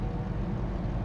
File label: idle, vehicles; s5; TDMCarpack; TDMCars